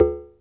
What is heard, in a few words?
QUICA, DRUMS; Music Store Sound Service; Einstein